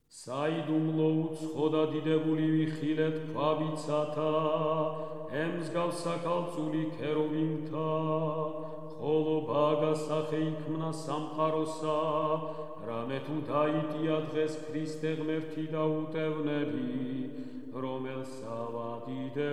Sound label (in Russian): irmos iz kanona; Rozhdestvu Hristovu